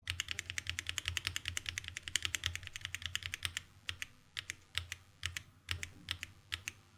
Gateron Switches Sound Test Red Blue Brown Yellow White Black Green